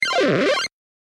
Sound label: 8 Bit